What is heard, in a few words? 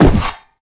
mattress; impact; midnight guns